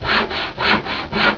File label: saw